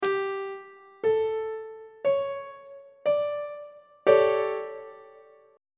c double sharp major 7th suspended 4th 1st inversion; on treble clef